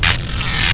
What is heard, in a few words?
shock